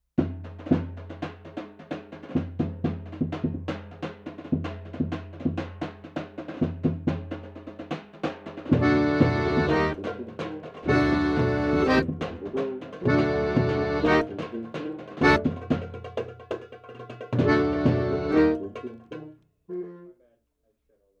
accordian DI

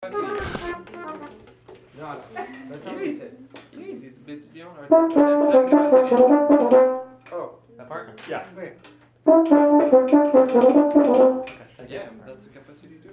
midbrass lick rehearsal

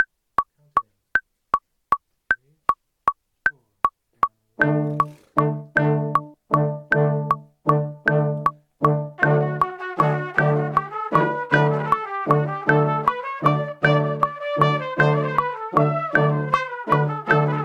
click 3 4 6 8 bpm=156

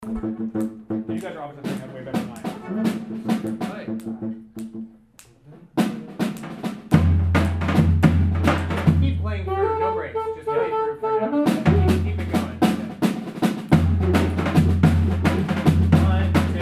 cocek rehearsal

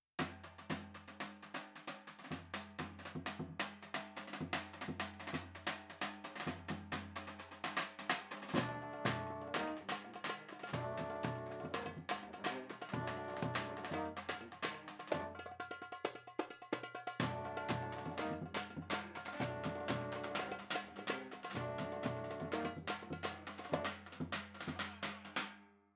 second tenor sax

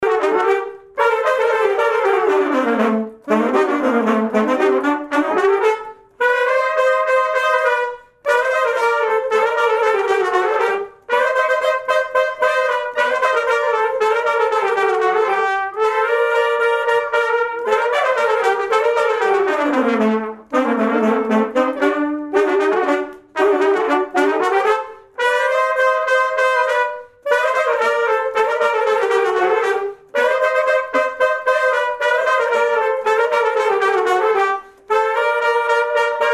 melody rehearsal

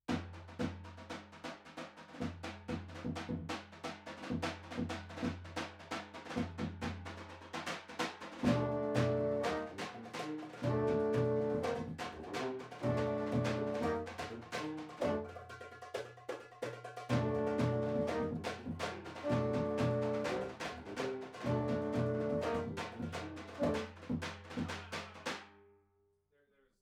trumpets

